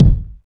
kick single hit g sharp key, kick single shot tuned to the G# note